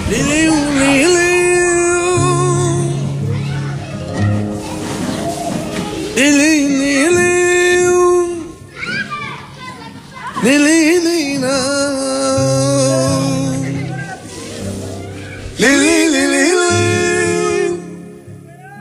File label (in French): Chant gitan